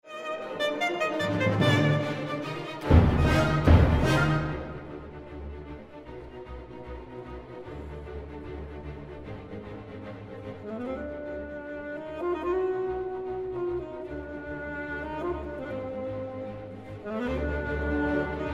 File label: Sax Con